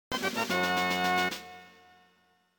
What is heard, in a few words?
Weapon Upgrade; Donkey Kong 64